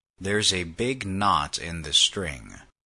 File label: string